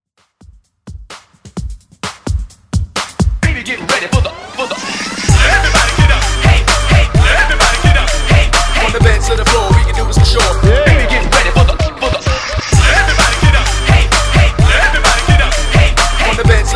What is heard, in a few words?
Throwback Party Break Intro